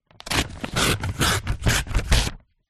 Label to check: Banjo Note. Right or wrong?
Wrong